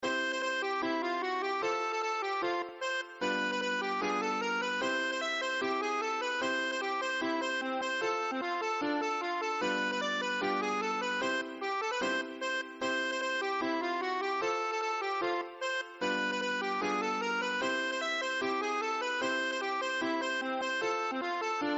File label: polka